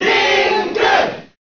Cheer Japanese; Link; SSBM

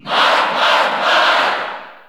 Cheer Italian; Marth; SSB4; SSBU

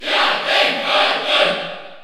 Cheer French; Captain Falcon; SSBU

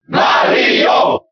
Cheer German; Mario; SSBB

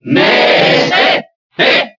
Cheer Spanish; Ness; SSBB

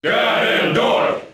Cheer NTSC; Ganondorf; Brawl

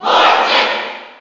Cheer NTSC; Morton Koopa; SSB4

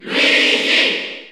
Cheer Spanish; Luigi; SSBU

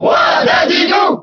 Cheer French; King Dedede; SSBB

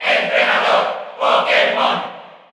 Cheer Spanish; Pokémon Trainer Male; SSBU

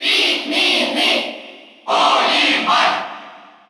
Cheer Russian; Olimar; SSBU

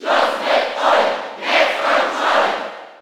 Cheer German; Shulk; SSB4

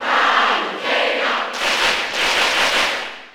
Cheer German; Palutena; SSB4

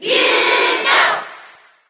Lucas Cheer JP; SSB4

